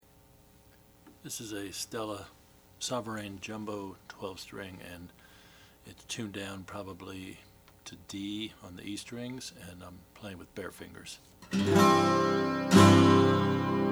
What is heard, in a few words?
oscar schmidt sovereign natural